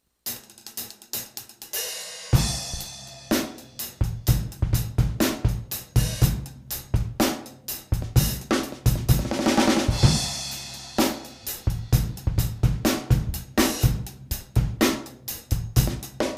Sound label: Funky, 130 BPM, Another funky groove w/various influences.; generally, no click; drum